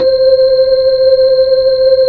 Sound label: B-3, Hollow; Keyboards of The 60's and 70's